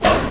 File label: Breaker